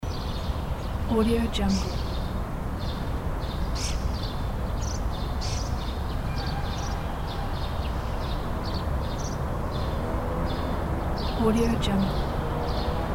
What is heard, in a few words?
city environment